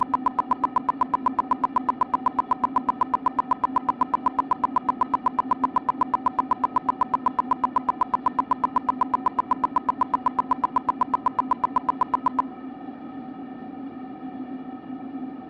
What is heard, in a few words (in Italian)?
burst 16ms 1kHz; LaSpezia; 30V; S1 trapez RCF Vecchia; Mic2 tubetto; CESI